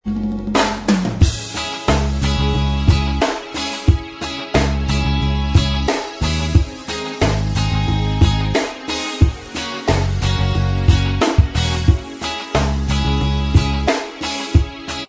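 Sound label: reggae style, Instrumental Track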